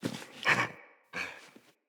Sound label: Wolf Cub Standing Idle Grunt; Vocals; Animals; Orengal Wolf Cub